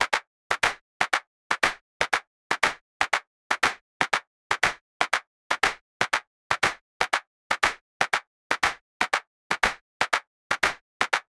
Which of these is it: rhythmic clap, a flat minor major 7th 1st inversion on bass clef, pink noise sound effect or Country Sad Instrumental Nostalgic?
rhythmic clap